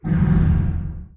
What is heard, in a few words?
distance laser fire, weapons; Stellaris